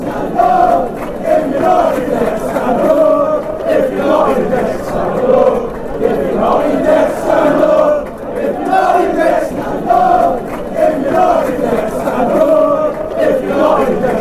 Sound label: stand up if yer not in debt fanchants